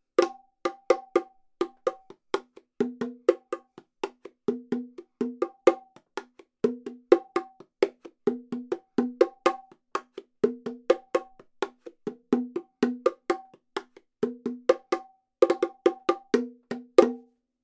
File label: KM 184 Bongos